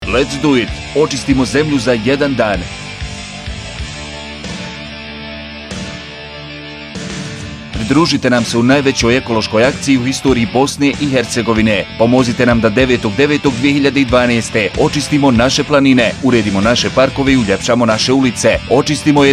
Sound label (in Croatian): ocistimo zemlju za 1 dan jingle